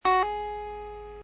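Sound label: hammer on, guitar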